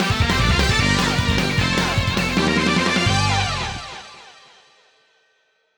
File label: Stage Clear; Wild Guns Reloaded; Reloaded Ver.